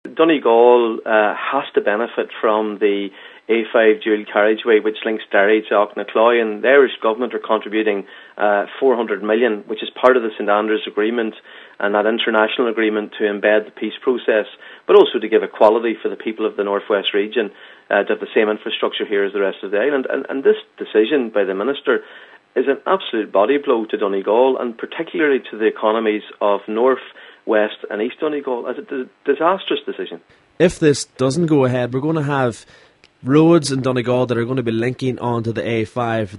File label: pad